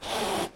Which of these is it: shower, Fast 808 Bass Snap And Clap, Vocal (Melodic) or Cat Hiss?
Cat Hiss